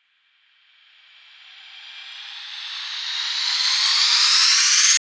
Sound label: White Noise Up; House; FX; Symbolic White Noise Up